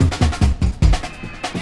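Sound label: drums and bass, Loops; Distored Reality 1&2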